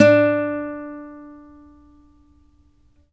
ACC FG D, Acoustic Guitar; East West; Steve Stevens Guitar Samples Collection

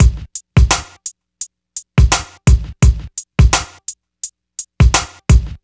DRM, Hip Hop and R&B Drumloops; Best Service ProSamples vol.01; AKAI

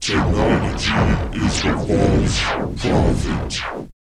PITCH MOD, Vocal, SCI-FI; 5 SCI-FI CHZ

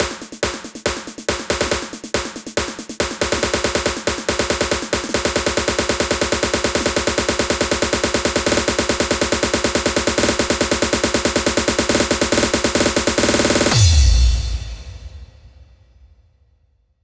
Snare Roll, Drum Loops 140bpm; Zero-G Trance Inducer

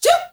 FEM GRP, FEM NOISE; Spectrasonics; Heart of Africa

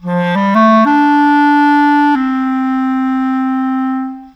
Rock Pop Clarinet, Orchestral; Sonic Foundry (Sony Creative Software); Orchestral Series VOL-4 - Rock and Pop

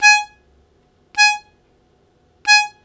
Short Attack Ab2, Harmonica; Zero G - Harmonica

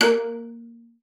A#3, VIOLA FINGER; Xsample VOL-5

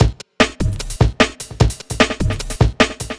150, Drum Loops; SomeOfThat; M8; Electronisounds

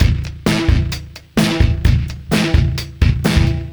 fx 129, Beat Loops; Zero-G; Monster Beats

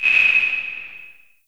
LH CL808, REVRB LARGE HALL

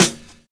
SD, Drums Dance & Groove

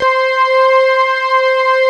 SYNTHS; Pro-Rec - Synthsonic; JV ANALOG

